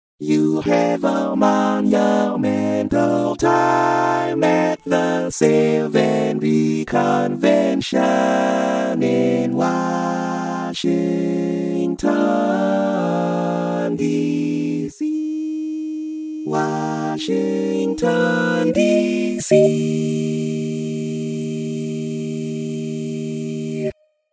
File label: All Parts, barbershop